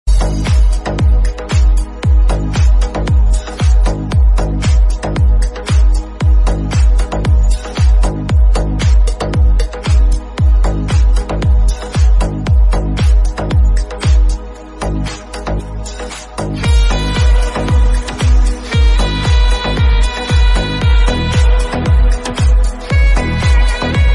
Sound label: sax